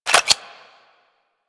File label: Clip Out, Weapons; Warframe; Furis